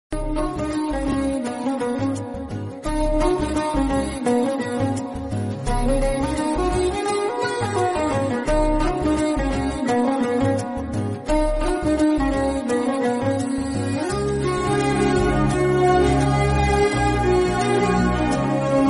love movie theme music tone ringtone

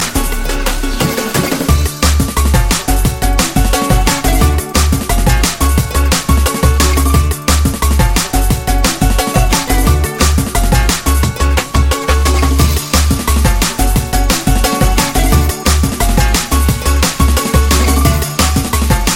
drum and bass ringtone

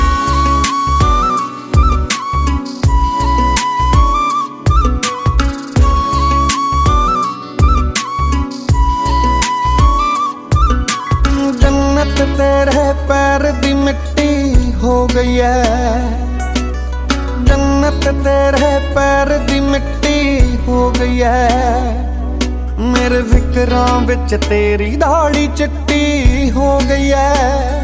punjabi ringtone

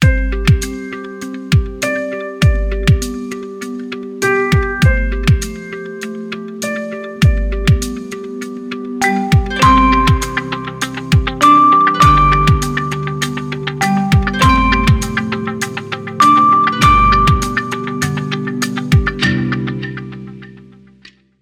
oppo a83 ringtone

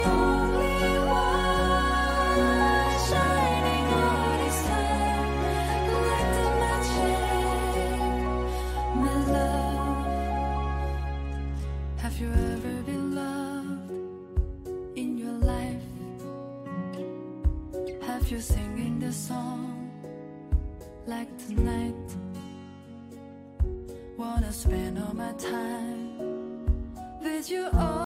english music ringtone

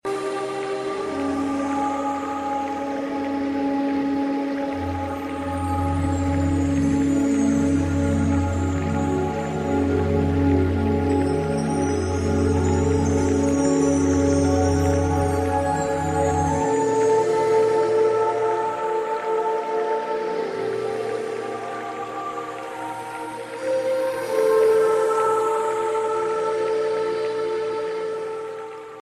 tranquility nostalgic music contact tone ringtone

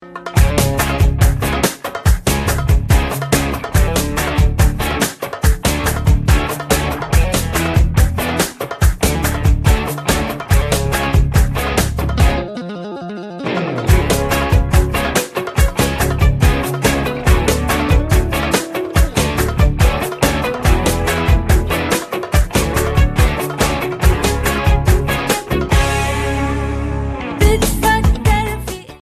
sea music ringtone

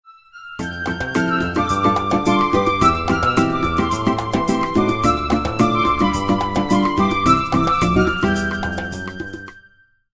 samba ringtone

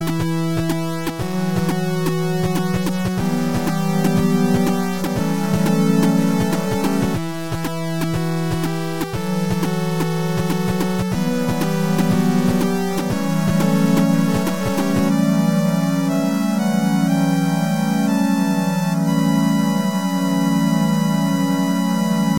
theme 8 bit ringtone; halo

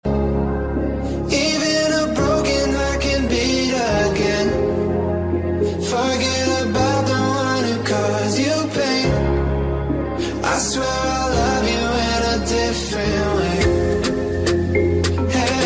ringtone, english

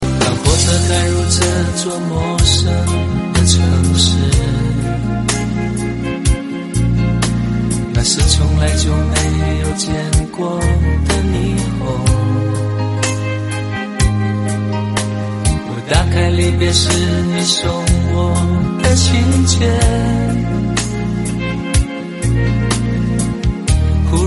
chinese song ringtone

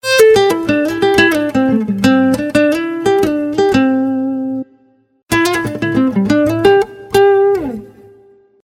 guitar ringtone

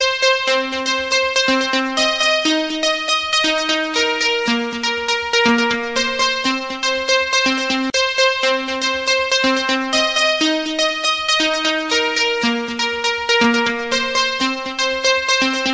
oppo f 9 pro ringtone

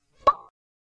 text ringtone, sms ringtones

